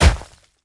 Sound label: snowball hit; Scrat